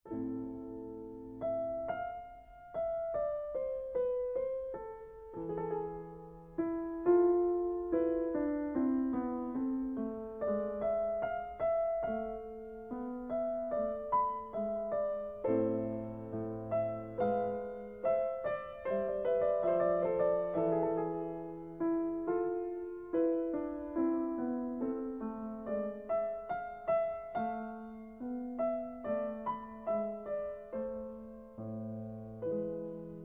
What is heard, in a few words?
Bach Piano Concertos